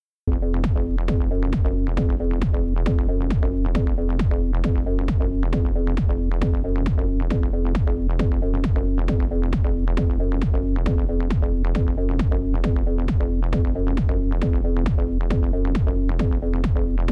old school acid techno; monument podcast

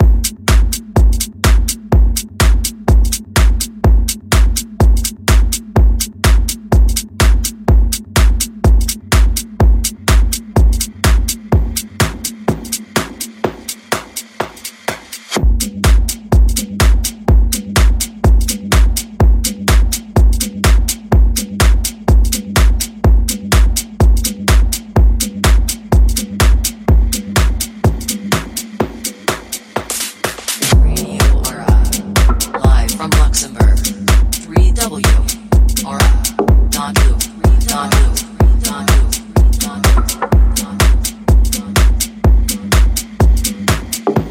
melodic techno podcast; radio ara lu

